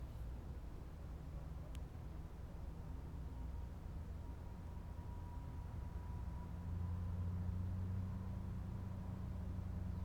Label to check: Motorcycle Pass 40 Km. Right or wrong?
Right